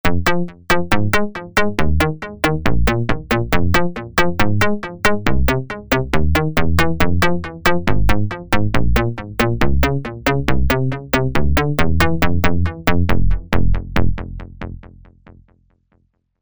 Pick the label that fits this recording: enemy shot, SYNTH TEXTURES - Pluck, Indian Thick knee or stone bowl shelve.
SYNTH TEXTURES - Pluck